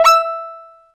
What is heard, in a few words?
ding